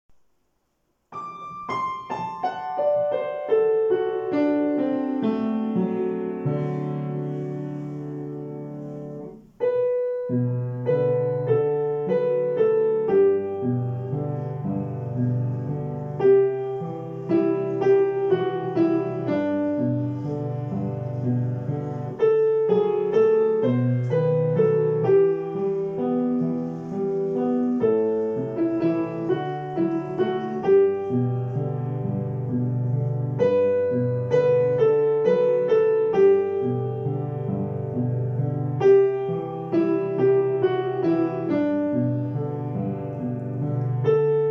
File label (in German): Klavier; Leise rieselt der Schnee